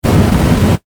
Science Fiction Flame Booster Short Burst; Spacecrafts

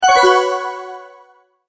Multimedia Tone-Return/Back

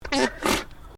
ANIMAL ALPACA MALE GRUMBLE; Alpacas on a farm in Gippsland Victoria; Miscellaneous Animal

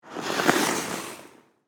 Ice Skates Coast, By, Fast; Sports; Ice Skating Sound Effects; BlastWave

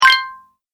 Award - Game Collect; Video Game Sound Effects

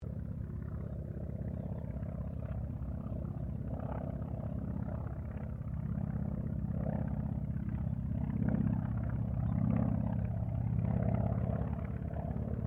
AIRCRAFT CA-28 CERES PASS; Airplanes; Vehicles; Aircraft Collection

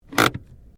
Car Ford Focus Handbreak On; Ford Focus hire car; Cars; Vehicles

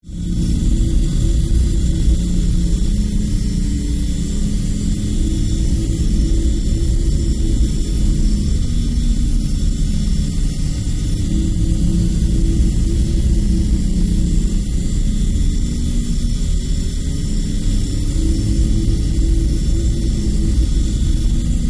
Drone Wind, Electronics, Electricity; Production Element, Imaging Element, Accent, Transition; Drones; BlastWave

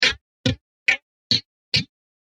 5 Clicks Short Hits

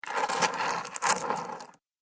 Coins Moved Around Table; Money Sound Effects

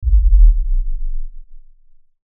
LFE Ultra LFE Hit, Lfe Ultra Low Bass Hit Impact

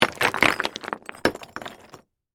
Crash, Rock, Debris, Small, Dump; SoundEffects-BlastWave

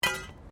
METAL SPIKE FALL; Materials

